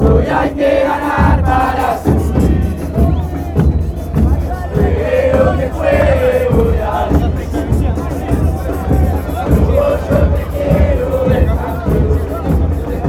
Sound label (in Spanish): chant hu, fanchants; huracan